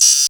synth, Dance Synths Trancers

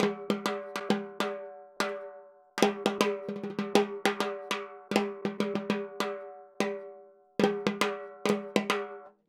Timbali, Latin Percussion

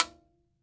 TIMBA, TIMBALES

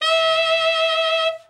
TENOR, TENOR SAX; Best Service; Brass Super Section

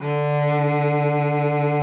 CELLO VIB D3; Xsample VOL-7

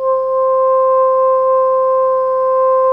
SOPRANO C 4, Saxophones; PP; Greytsounds; Philip Wolfe David Crozier's Saxophones

